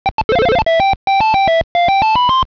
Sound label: door door; ドアドアmkII; V1Sモード：mkII音源; ＰＣ８８０１